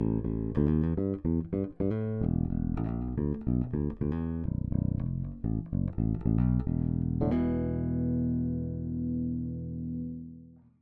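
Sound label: Big Single Passive Bridge, 5-String Pickup; Fralin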